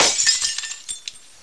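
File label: glass break; duke nukem total meltdown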